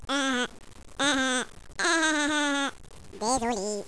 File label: children victory; valve